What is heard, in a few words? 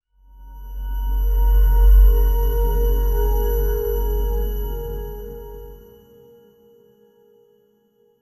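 premium smartphone interaction, user interface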